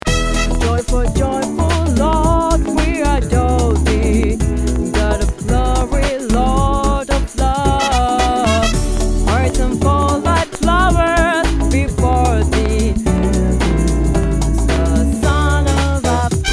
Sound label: Joyful Tenor; B Voice; christmas